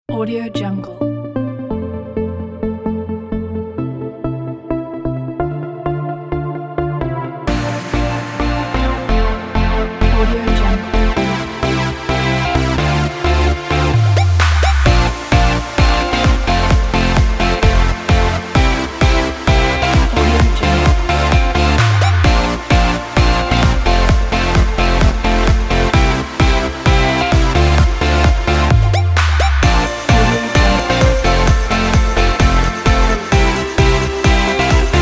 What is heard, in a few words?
love dance